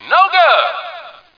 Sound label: Own Goal